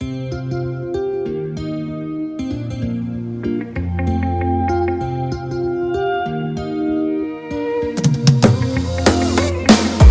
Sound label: Instrumental